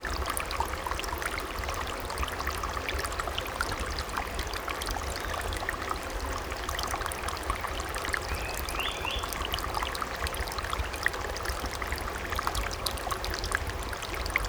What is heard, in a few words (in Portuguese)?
Nascente de Agua captada com Hidrofone e Ambiencia Juntos